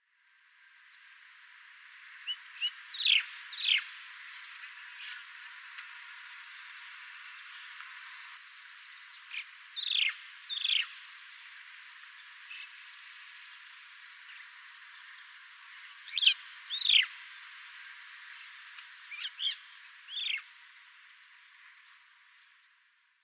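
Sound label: hakkacho, Crested Myna; himeji; koe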